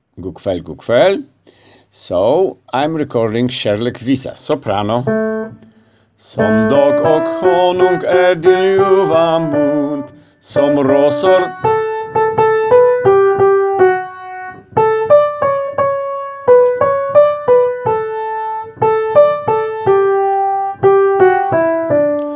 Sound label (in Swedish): sopran